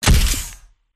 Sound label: silverback impact; gow